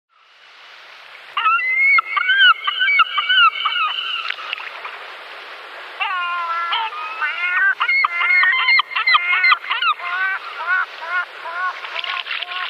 Iceland Gull calls immature

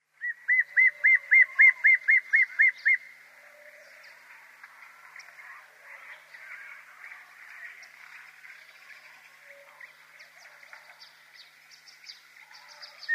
Dark Chanting-Goshawk immature

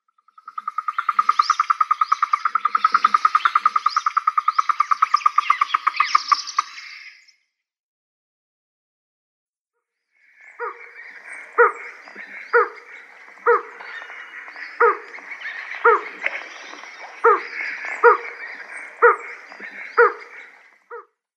Senegal Coucal calls

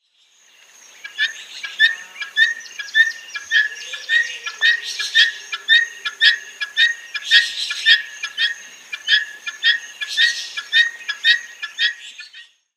Helmeted Guineafowl male display

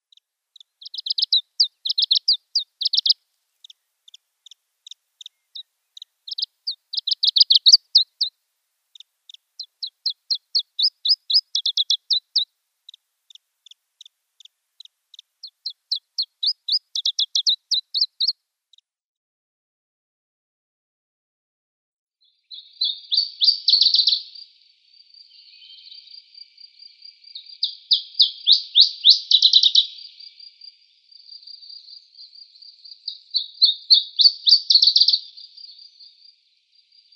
Iberian Chiffchaff song

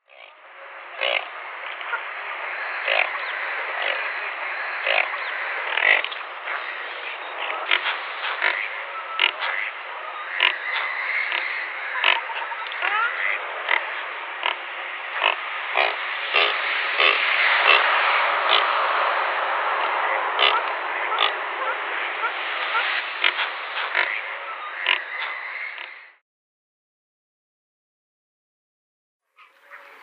Dalmatian Pelican

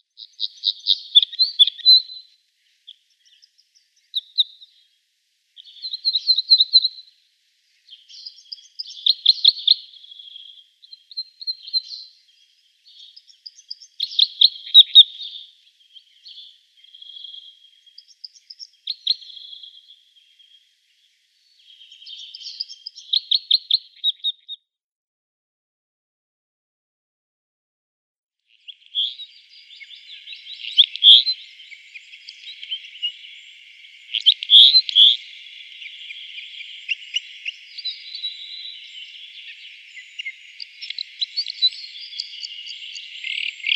Scottish Crossbill song

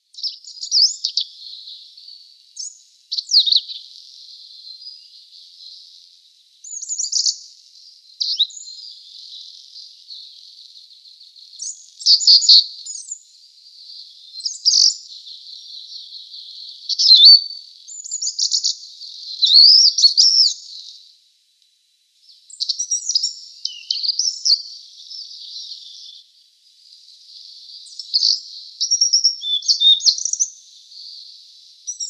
Asian Brown Flycatcher song

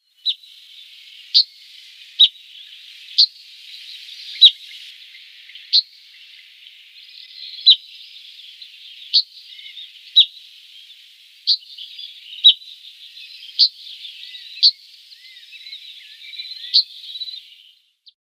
Cinereous Bunting calls

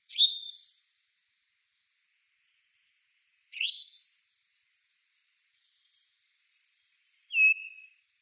Eastern Towhee call

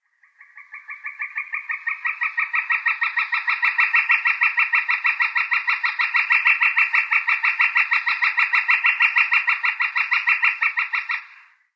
Northern Flicker display

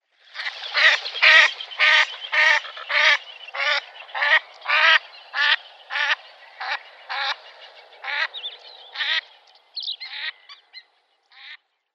Cape Teal female flight call

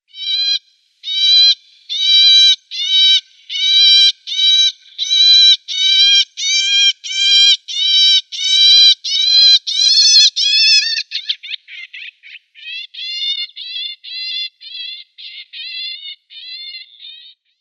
Southern Grey Shrike nestlings

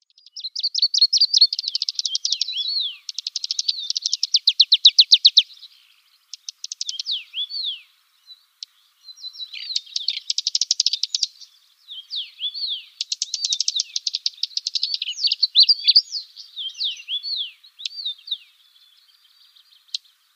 Dusky Warbler calls

